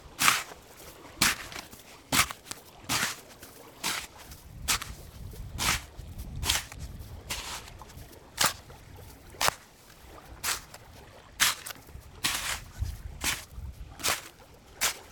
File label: Wet Sand Digging